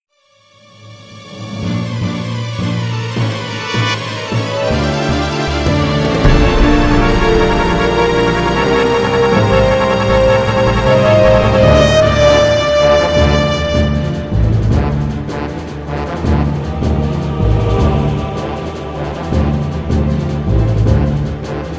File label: space environment; warcry boss1